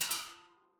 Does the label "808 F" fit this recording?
No